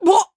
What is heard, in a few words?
Female shout of pain; Don't Be Afraid The First Toy